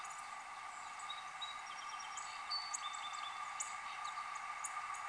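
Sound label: Song Sparrow song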